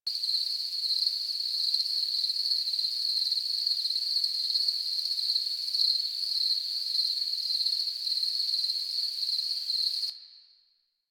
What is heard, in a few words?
Night Insects High Loop A; Ambience; Props; Loops; Warframe; Eidolon Plains